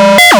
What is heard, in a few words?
short tech